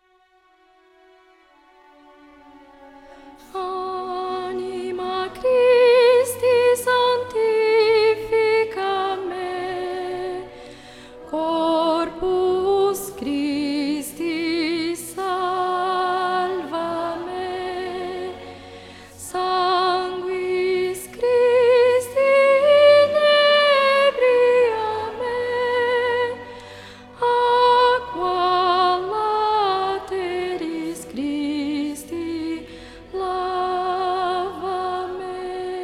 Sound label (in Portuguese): Anima Christi Soprano